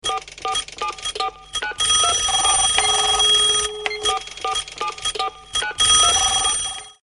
Telephone Mix Dj Alert

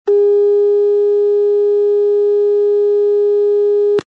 alarm clock signal tone

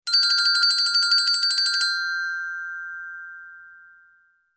Sparkle Alert

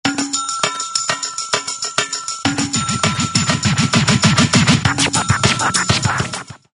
feel the triangle and scratch

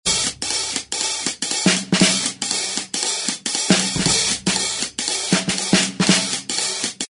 rhythm jazzy drum hi hat

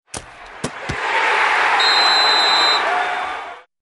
Soccer Alerttone

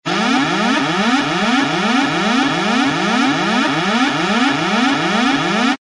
alarm airplane emergency

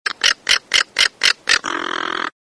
birds condor call

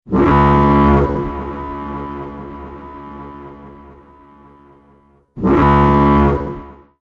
Extra Loud Alarm

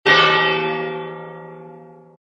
gong dinner

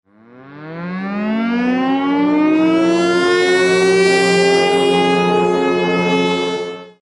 Civil Defense Alarm Air Raid Siren Ringtone

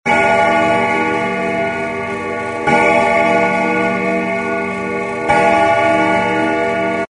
clocks grandfather clock hour chime

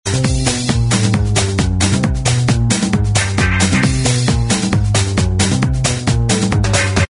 walkin jazz bass

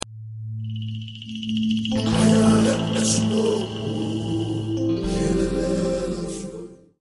calm and enveloping

